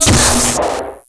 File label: stunner, stunstick; alyx; hl2